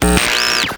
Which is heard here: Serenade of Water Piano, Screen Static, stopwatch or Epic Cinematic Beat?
Screen Static